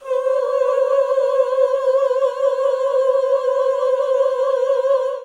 OOH C4, GOSPEL; Best Service; Hallelujah; GOSPEL 10

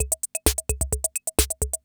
CR78

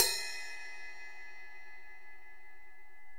Ride Bell p up3; EMU PX-7

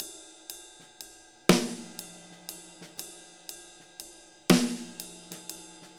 6/8 Loop, Drum Tools, Laid Back

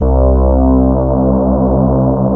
FAT LEAD, Powered DX; Wizoo

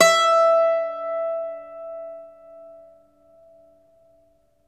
Irish Bazouki; E-MU Producer Series Vol. 7 – Old World Instruments

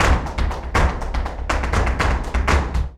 BULERIAS TAP, Flamenco

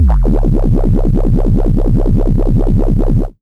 BASS Noise Wub; Polyend; Plughugger

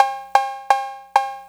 808 Cowbell

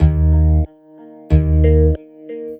BASS, Hip-Hop & R&B; USB Soundscan vol.47; AKAI

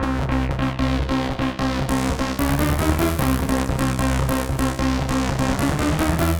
SYNC JUPITER; Masterbits - Tekkno Trax; SQ LOOP 150

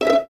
Violins E 5 Pizz; String Ensembles; Miroslav Vitous; 11 Violins

